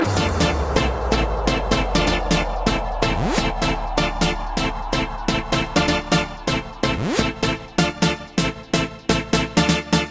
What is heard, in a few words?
New Electro Tone